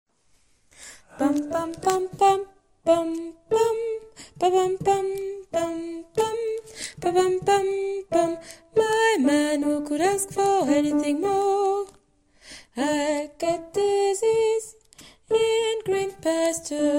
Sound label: basse E-F